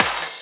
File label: Claps